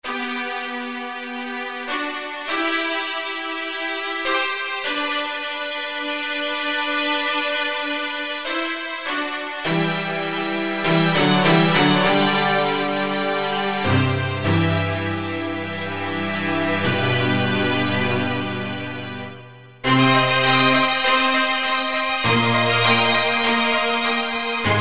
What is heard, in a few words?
orchestra strings